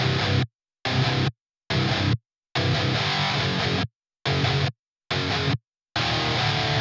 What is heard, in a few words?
Drums and Jingles Explore - Guitars; Metal Tales Fury of the Guitar Gods